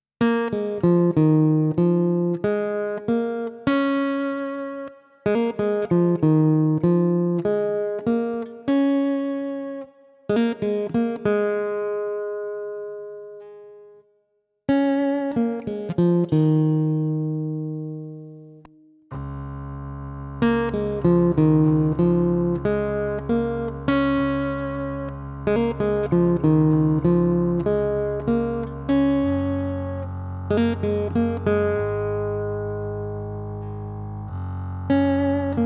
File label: Vietnamese Blues String Guitar